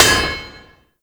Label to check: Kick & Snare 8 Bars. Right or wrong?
Wrong